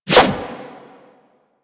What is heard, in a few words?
Prime Ankyros Whoosh F; Tenno